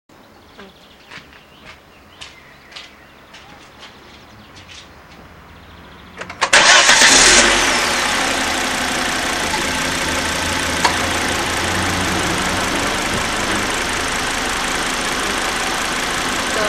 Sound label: s60 t5 engine, Volvo; A l'arret